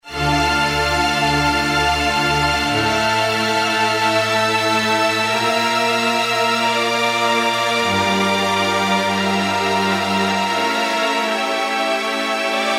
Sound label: Grand Strings, Kurzweil K2000; Kurzweil K2000 V3